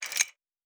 Metal Tools; Sci-Fi Sounds

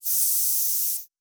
Air Hiss, Sci-Fi Sounds

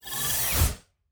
Door, Sci-Fi Sounds

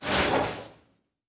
Metal Foley; Sci-Fi Sounds

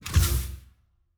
Door, Sci-Fi Sounds

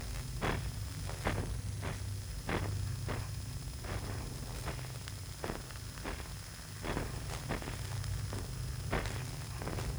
Hum Loop, Sci-Fi Sounds

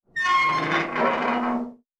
Metal Foley Creak

